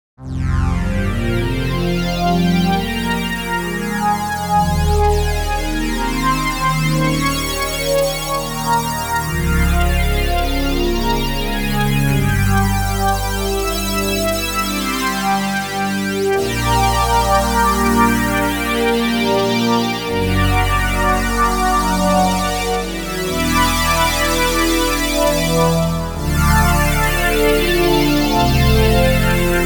a colourful rainbow pad

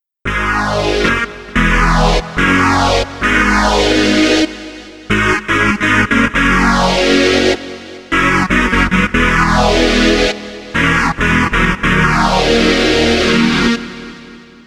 lead adventure lead

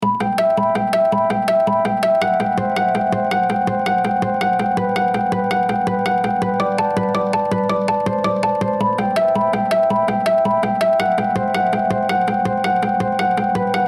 cloudburst acoustic

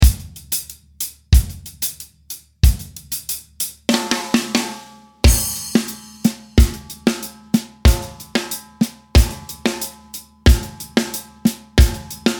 reggae

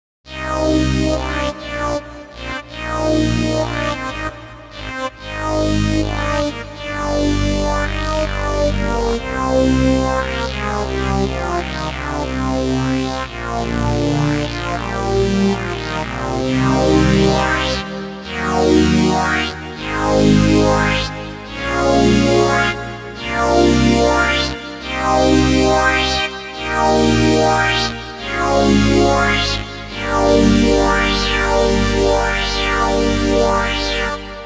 pad gamma velorum

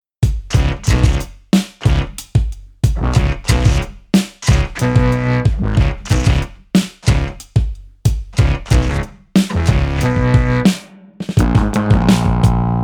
root bass keys

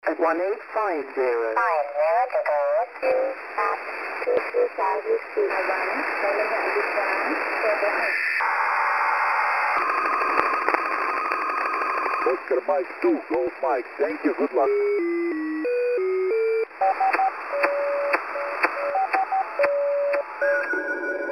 vintage 4 band radio sw

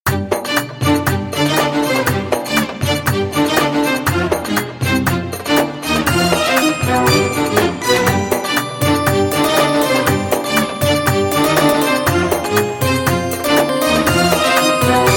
spiccato breack gliss

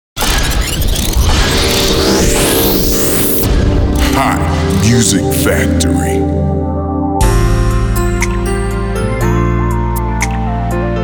urban gospel worship

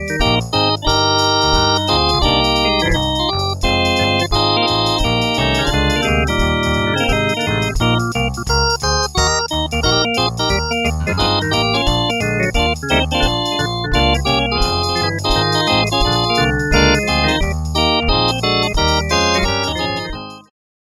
farfisa compact organ

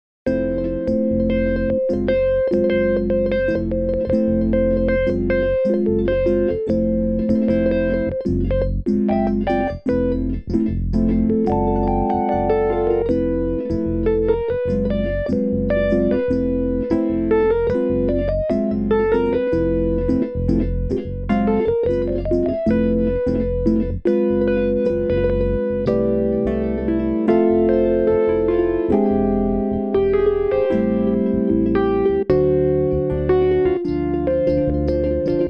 bossa; electrix

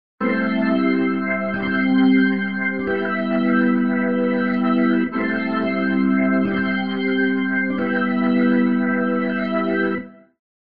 spinning motion organ wet

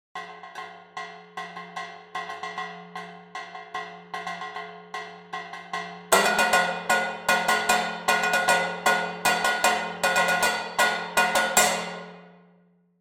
wood example

